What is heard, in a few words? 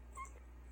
axolotl; mobs; mobs mc